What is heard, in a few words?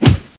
whack kick; Weird and Interesting Hits; single hits